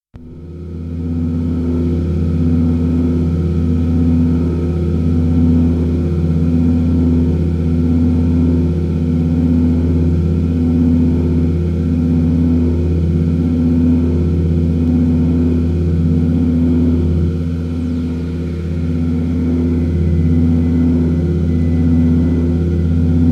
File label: tinnitus